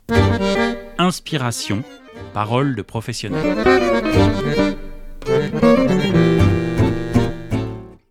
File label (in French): jingle inspiration; podcast